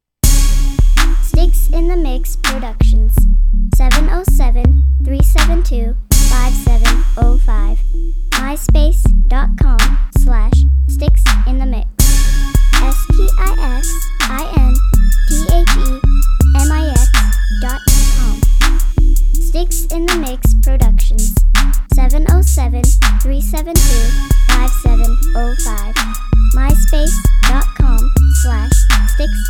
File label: street hymn